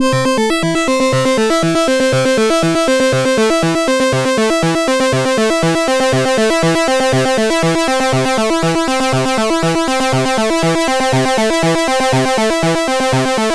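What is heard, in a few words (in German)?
Moogerfooger Synth zusätzlicher Fm Amount; Amazona Moogerfooger Workshop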